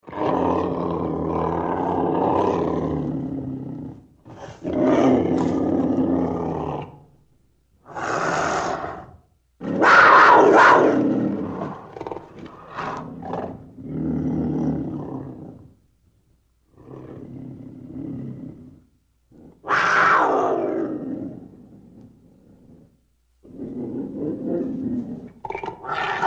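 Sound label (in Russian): pantera golos, panther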